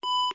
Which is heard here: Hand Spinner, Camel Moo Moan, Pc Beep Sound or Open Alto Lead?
Pc Beep Sound